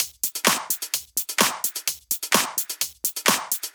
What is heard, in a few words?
Drumloops 128BPM